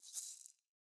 maracas Single Hit Back Shake; Raw Perc One Shots; Slate; SD SEISMIC Drum Sample Pack

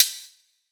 hat pop, Closed Hat, Drum One Shots; SD MIDNIGHT Sample Pack; Slate

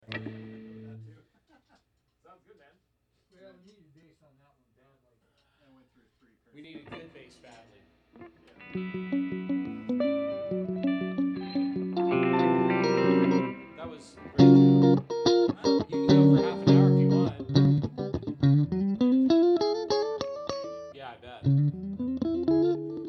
Guitars Mix

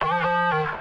phrase Db, Vocal; Slate Digital Omnivox Vocal Sample Pack

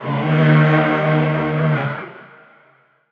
vocal chanting Eb; Slate; AURORA Sample Pack; Vocals

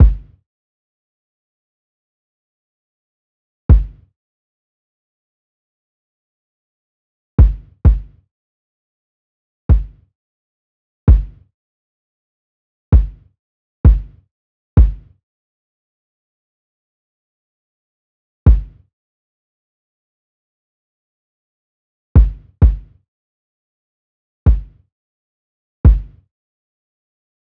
kick 65, Kick Loops; drum sample pack; Dukono; SEISMIC; Slate

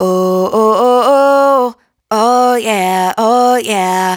riff Oh Yeah Oh Yeah 115 F#m, Dry Vocals; Raw Vocals; Slate Digital Omnivox Vocal Sample Pack

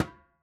dhol Single hit; Raw Perc One Shots; Slate; SD SEISMIC Drum Sample Pack

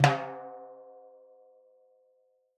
timbales Flams; Raw Perc One Shots; Slate; SD SEISMIC Drum Sample Pack

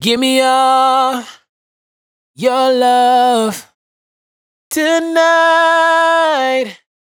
Dry Vocals hook Your Love Tonight 102 Em; Raw Vocals; Slate Digital Omnivox Vocal Sample Pack

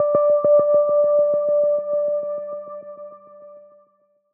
tonal oneshot D; Pop; Slate; PASADENA Pop Sample Pack; Tonal One Shots

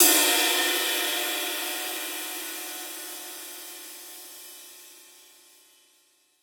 cymbal sizzle crash; Drum Sample Pack; One Shots; Slate; SEISMIC

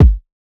kick diamond; Drum Sample Pack; One Shots; Slate; SEISMIC

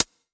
Closed Hat Quick, 909 Closed Hats; Slate; Vintage Drum Machines Volume 3 909 Edition

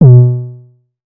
Synth oneshot Short B, Synth Bass; Slate Digital BASSHOLE Sample Pack

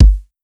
Kick, 909; Slate; Vintage Drum Machines Volume 3 909 Edition

